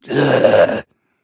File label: slash; cstrike